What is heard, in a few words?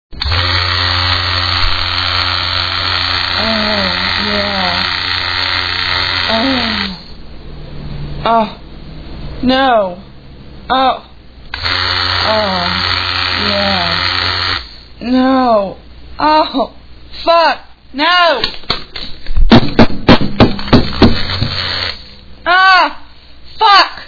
vibrator